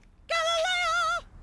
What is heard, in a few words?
Vocals only